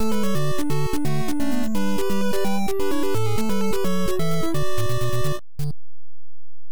Game Set; Famista 91; NES

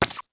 Paint gun fire; LittleBigPlanet 2; PS3

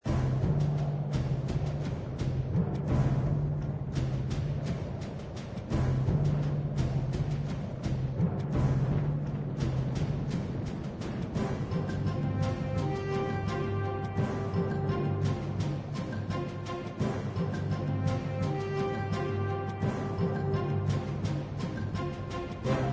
War Map Ambience; Clash of Clans soundtrack

